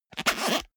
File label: zipper small case open fast